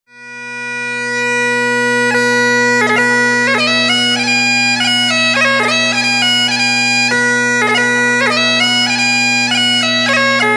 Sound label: Mairis Wedding bagpipes; Wedding bagpipe music